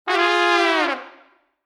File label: lose, CasinoGames; Baccarat; TopDogLabs